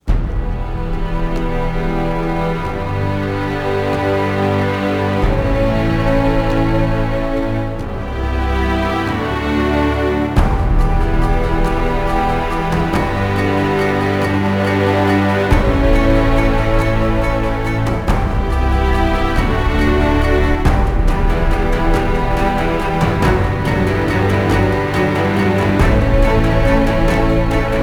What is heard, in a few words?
no choir